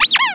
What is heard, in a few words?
squeak